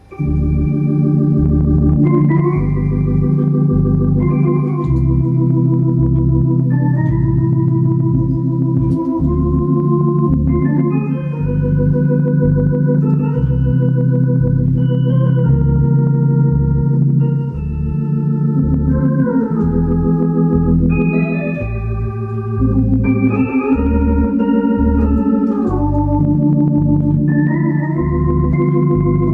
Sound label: slow son